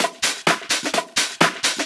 Houseloops 128BPM; Vengeance Samples

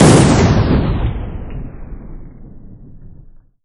Impacts

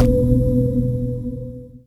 Synth Shots; Vengeance Samples

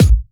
Kicks; Vengeance Samples

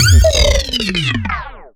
VFX2 Sub

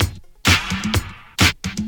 Housebeats, 128BPM; Vengeance Samples; VEH2 Loops

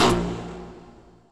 303 Acid B; Vengeance Samples

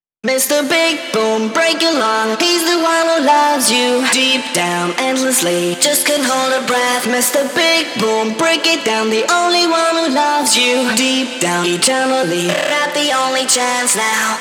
Pitched Vocals WET, 140 BPM; Vengeance Samples; Vocal-Hook Kits; Song Kit; Mr Big Boom

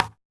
percussion; VES1 Percussion

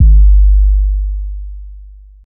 Bass Drop, 808; Street Anthems Sound Kit

